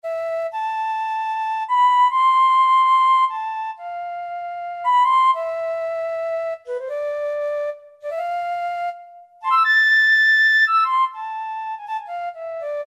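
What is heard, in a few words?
Single Flute, Alesis QS8; Factory Preset examples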